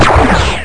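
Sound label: cannon; DARKMOON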